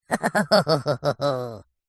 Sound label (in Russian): smeh multyashnyi